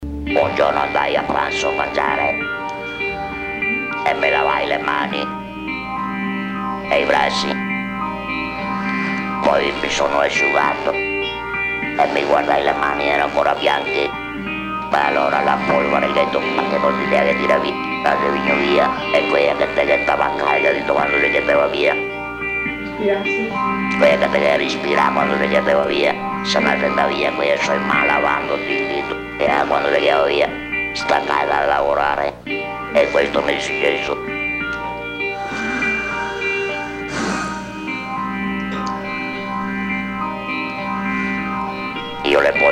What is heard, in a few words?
italian vox